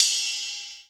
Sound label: RIDE; 90 s Sample CDs; Best Service Dance Mega Drums